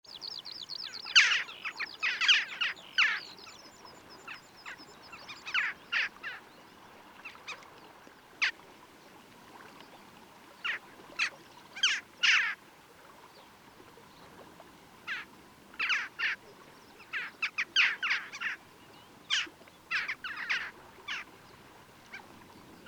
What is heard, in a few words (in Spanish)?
Grajilla occidental - Coloeus monedula; ocells